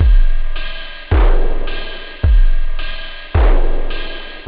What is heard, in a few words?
core, techno; OctaMED SoundStudio V1